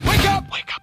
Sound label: alerta; wake up shop suey